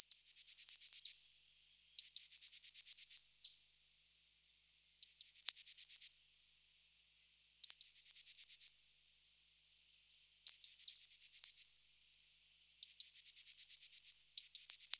mesange bleue; TOULON; PRESQUILE

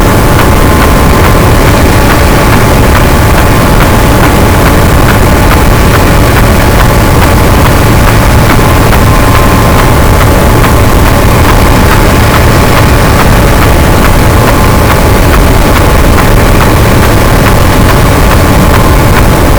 cachalot negatif, gaussian noise, Physeter Macrocephalus; SNR 24dB

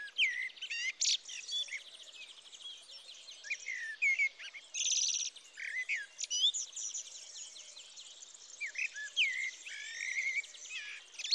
with energy merle noir; TOULON; PRESQUILE

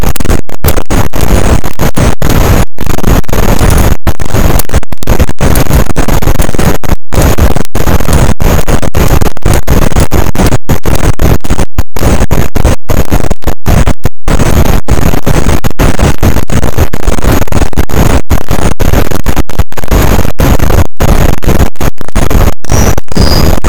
cachalot positif, boat; Physeter Macrocephalus; SNR -6dB

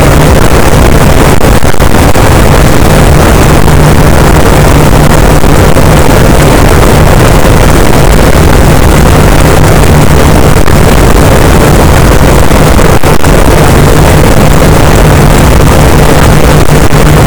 cachalot positif, boat; Physeter Macrocephalus; SNR -6dB

